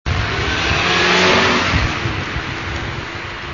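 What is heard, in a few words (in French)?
auto voiture accélération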